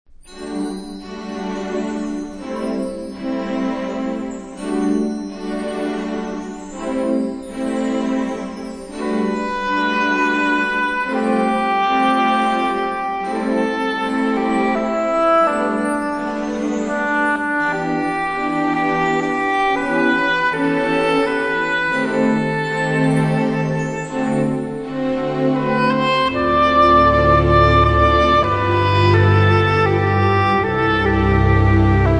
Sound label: Orchestra